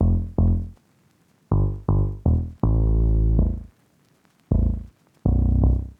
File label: Classic Melody Loop - 160 BPM A Min Bass; Loop Stems; Cymatics; 2020 Melody Collection